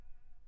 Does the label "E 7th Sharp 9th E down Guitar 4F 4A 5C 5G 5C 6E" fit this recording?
No